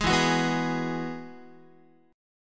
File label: E Major Add 9th, Guitar; strummed; JGuitar